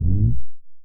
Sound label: hunger up; casualtiesunknown